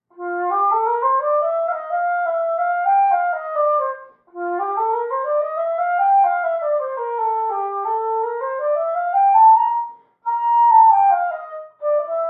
Wind Instrument; Assassin's Creed Syndicate

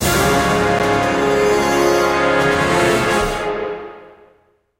Chase Stinger

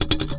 Shake Metal Loop; talking tom cat 2 android ios online gamerip 2011

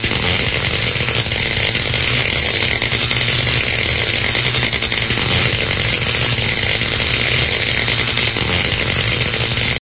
Electro

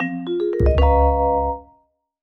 Jingle Foul; clubhouse games 51 worldwide classics 2020 switch gamerip

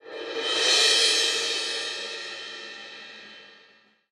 Battle (Music 4ch Cymbal); Kingdom Hearts III Re Mind

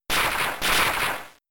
Thunder; Thunder part 1

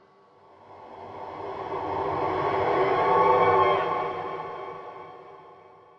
echoes; thief 2014 gamerip

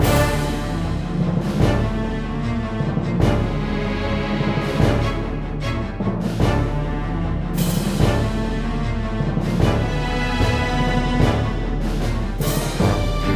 Outro Music

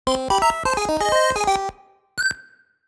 Lose Life; Kirby; Classic Music Collection

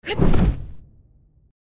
Trample Random; Goddess of Trampling

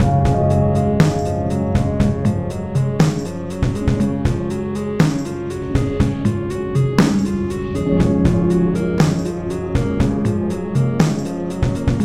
Zoom Piano House; minecraft story mode gamerip 2016; A Portal To Mystery

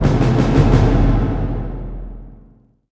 Player Win

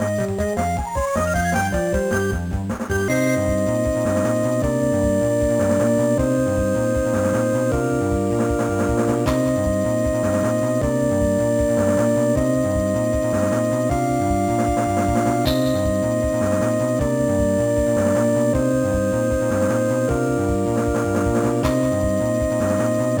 Victory; Lunar Legend; gba

